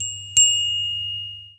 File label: bell